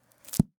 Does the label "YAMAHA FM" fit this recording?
No